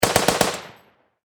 Warfare Machine Gun .303 Caliber Vickers Short Burst Distant Perspective